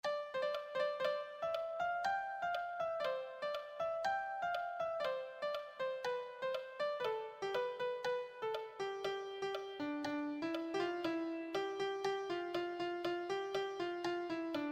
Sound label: melody